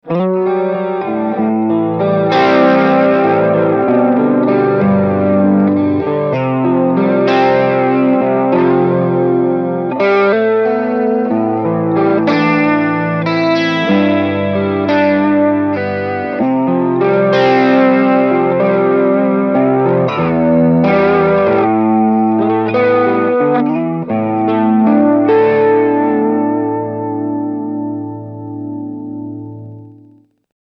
BOSS CE 1; Chandler

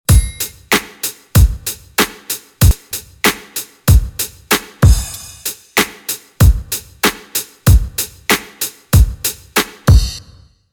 X32 Ambience; Behringer

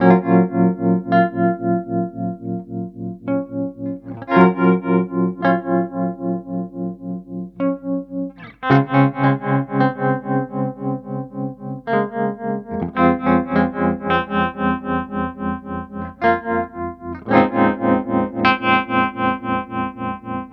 Wampler Terraform Tremolo

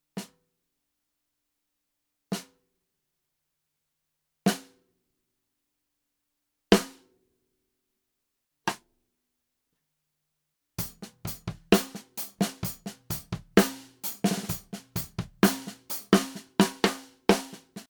TAMA LAL145 mid

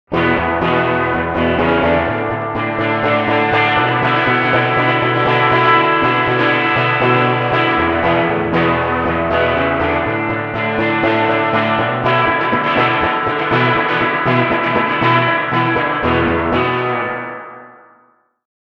Twang

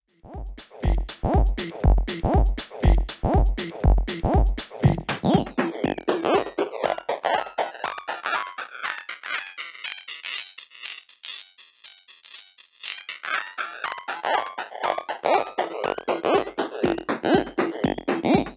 Reloop KUT Crush

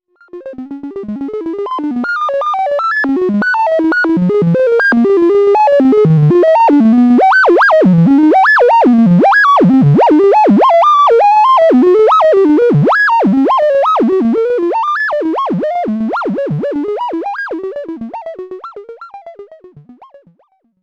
VC LFO S H slew; MFB

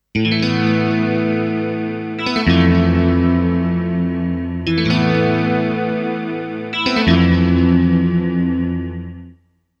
strat; equinox

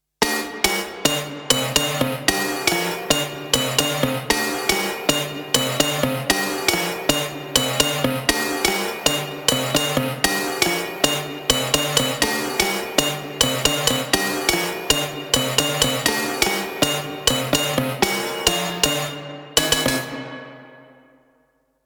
Emax Metallic

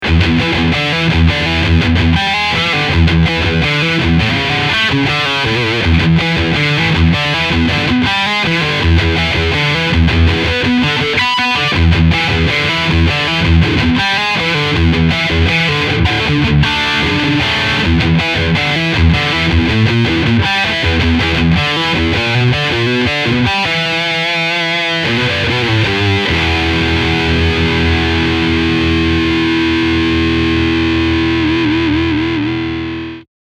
OD Sound III

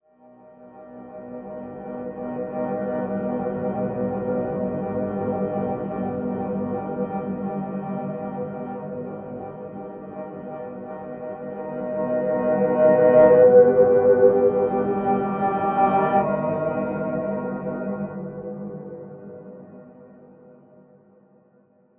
Novum TIMBRE Flower Unten; Dawesome

